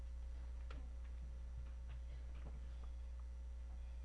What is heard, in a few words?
sea